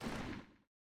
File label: small Migration Jump; HeavenStudio; flock Step